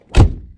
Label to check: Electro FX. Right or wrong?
Right